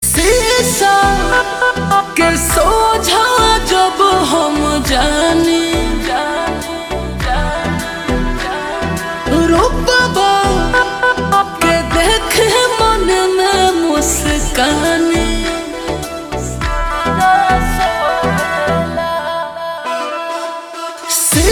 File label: Ringtone Slowed Reverb